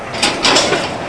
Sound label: uncouple